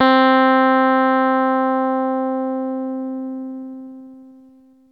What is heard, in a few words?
Techno leads, hits and riffs